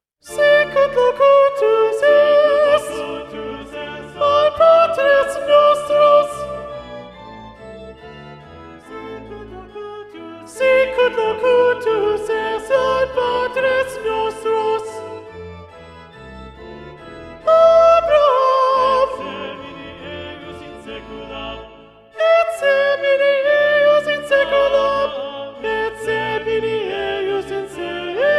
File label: Soprano Predominant; Durante; Sicut locutus est and Gloria Patri (Magnificat)